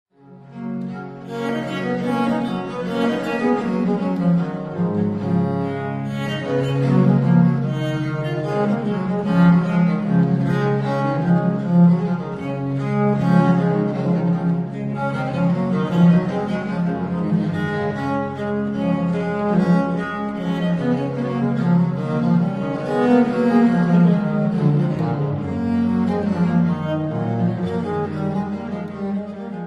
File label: for 2 instruments in G major; Vivement